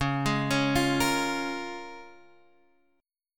C sharp 6th C sharp down; arpeggiated; C#6; JGuitar

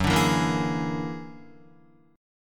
Gb Augmented 9th Gb down Guitar 4E,4A,5D,5G,5C,6F; Gb+9 strummed

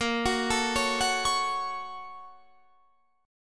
Bb Minor Major 7th Sharp 5th, Guitar, Standard; BbmM7#5; arpeggiated; 18,21,19,18,19,21; JGuitar

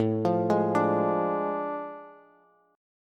A Major 7th Suspended 4th Sharp 5th, Bass; arpeggiated

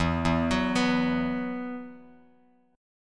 E Suspended 2nd E, down, Bass Standard

